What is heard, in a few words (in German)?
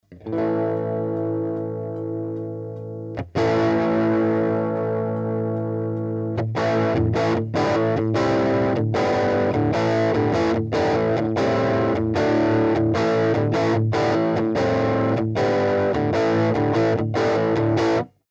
Crate Combo SM57 Low Gain mit Strat; Millennia HV35